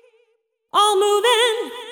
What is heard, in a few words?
deep house female, 125, A, vocals